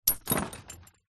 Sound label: impacts glass bottle shard hit junk in plastic bin smash